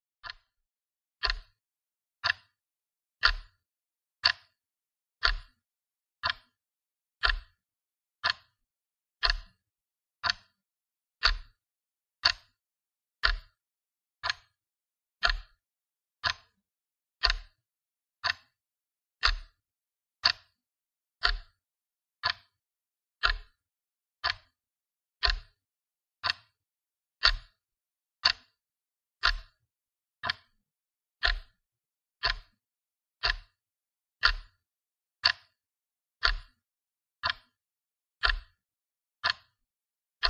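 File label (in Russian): zvuk sekundnoj strelki, second hand ticking sound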